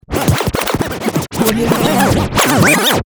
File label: dj scratch